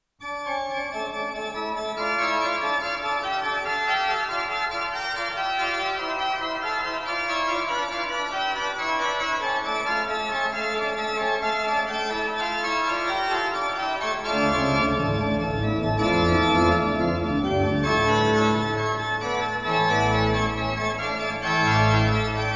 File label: Toccata; organ; BWV 538; Toccata and Fugue in D minor 'Dorian'; BACH